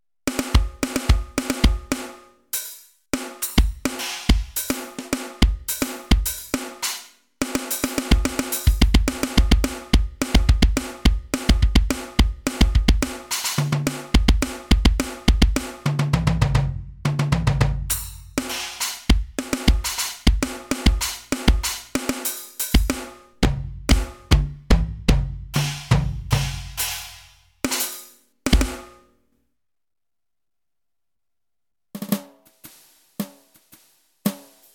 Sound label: ROLAND SPD 20 PRO Drum Kits